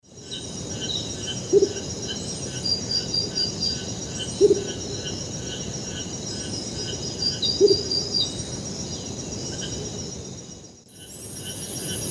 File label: Baryphthengus martii, hoot and roll song; S. America; Eastern Lowlands